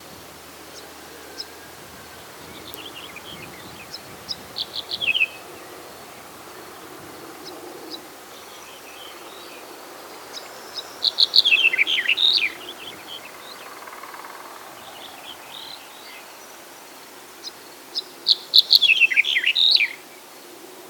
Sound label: Black headed Bunting Emberiza melanocephala